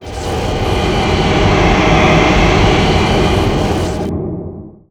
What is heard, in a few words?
black hole chasm; weapons; dota; enigma